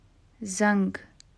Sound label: bell